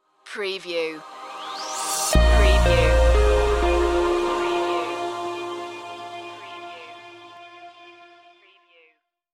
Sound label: night owl stinger